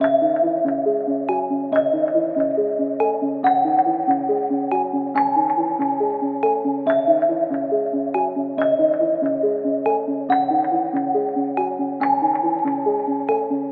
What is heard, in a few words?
Melody Loop 140 BPM C# Min; Cymatics